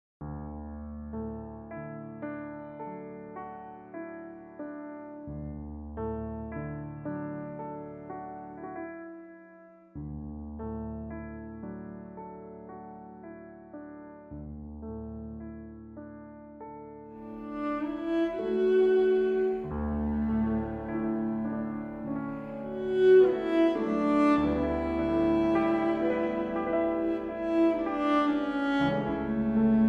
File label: cello